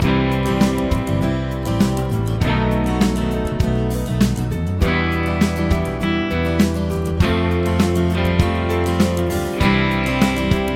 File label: progs with applied chords